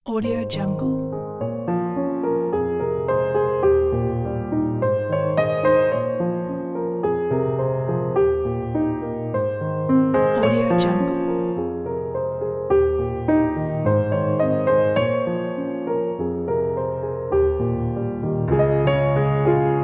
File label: positive sentimental cinematic